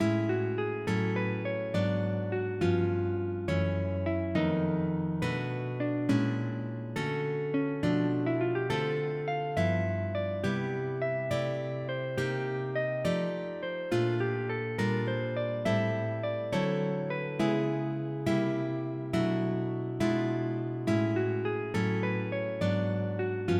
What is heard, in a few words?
Musette